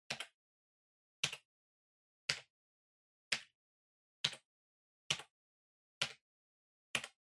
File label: PC Keyboard Far Single Mid